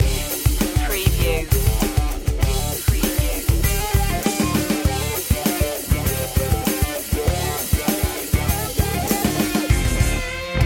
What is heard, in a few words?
hard like a rock loop